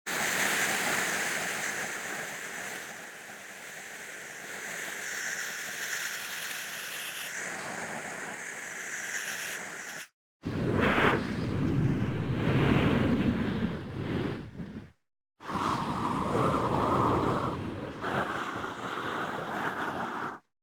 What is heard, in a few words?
Aggressive Zombie, Monster Zombie Vocal; Coll Anderson